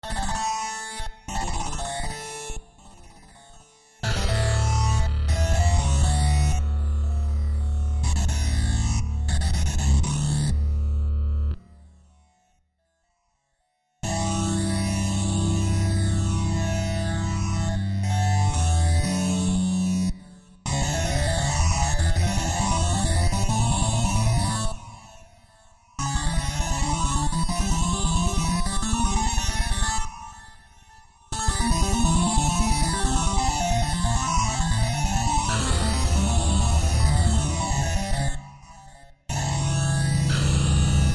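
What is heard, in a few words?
toccata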